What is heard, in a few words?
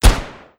gunshot; gfuel